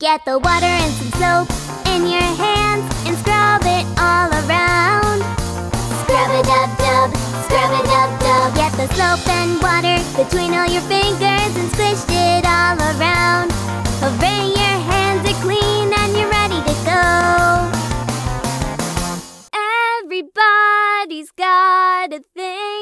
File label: SINGING